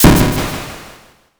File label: thunder magic